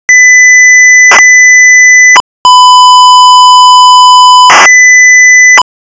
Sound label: hello squarewave; MPF-I88